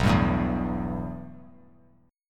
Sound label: B 7th Suspended 4th Sharp 5th; B7sus4#5; strummed; JGuitar